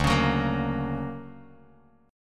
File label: D Augmented Major 7th down Bass Standard; D+M7 strummed; JGuitar